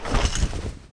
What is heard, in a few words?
WHIP OUT; maskdemo; Sierra Audio